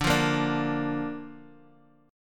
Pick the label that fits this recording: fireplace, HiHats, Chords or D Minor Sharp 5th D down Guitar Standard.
D Minor Sharp 5th D down Guitar Standard